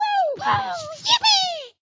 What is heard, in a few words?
Shake foam Sfx